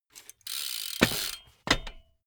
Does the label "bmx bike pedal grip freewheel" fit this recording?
Yes